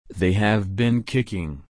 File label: kick